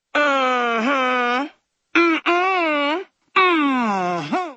mmhhmm

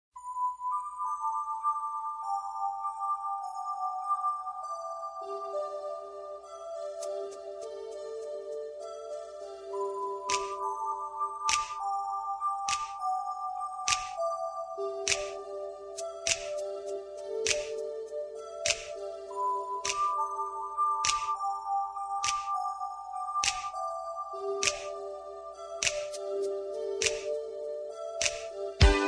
instrumental

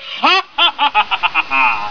Hahahaha

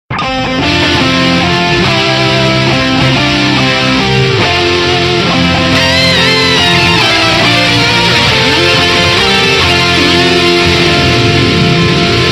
Happy Metal

